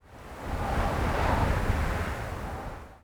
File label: wind gust